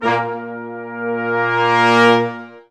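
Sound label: Sections, Brass Large Ensemble sfz; Roland LCDP06 Brass Sections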